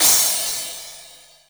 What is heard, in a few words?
crash; fofix